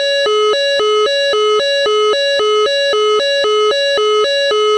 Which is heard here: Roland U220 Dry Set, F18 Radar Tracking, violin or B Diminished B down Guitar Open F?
F18 Radar Tracking